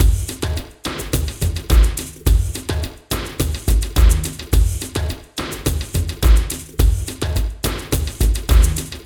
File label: Night Attack; Beefense BeeMastered; Beefense Night Attack3